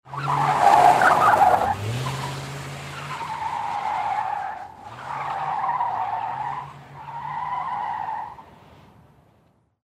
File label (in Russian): mashina driftuet pered nami i na obshhem plane